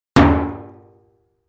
Metal 50 Gallon Drum, barrels buckets & cans

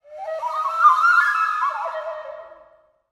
Columbian Flute

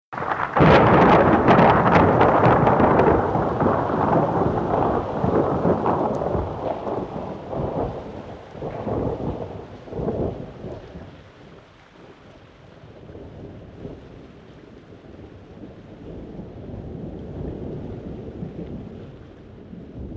Strong Thunder Claps

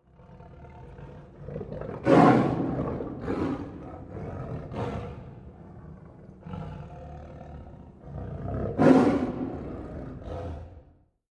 Animal: Single Tiger Snarl And Ro; big cats

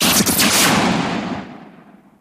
Hand Phaser Sngl Sho

